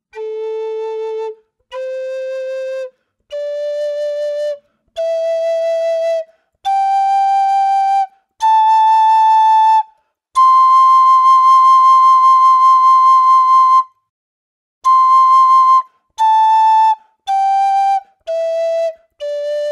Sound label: Pentatonic minor Scale